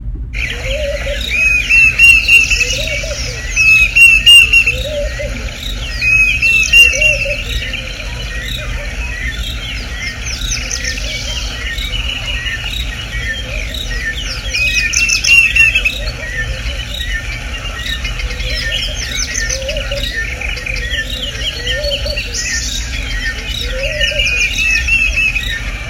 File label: Morning bird choir